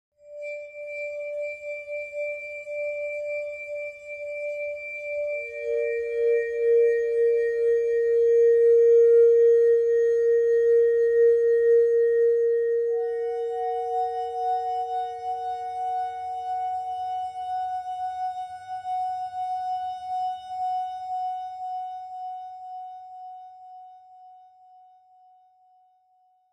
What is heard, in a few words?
melody b, ambient; Factorio - Space Age